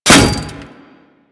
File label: Bullet Impact Metal No Whizz; Warframe; Bullet Impacts